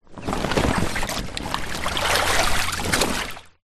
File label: transport boat dinghy rubber small water inside movement